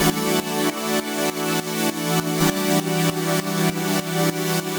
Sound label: Pad 100 E, sidechained samples 100bpm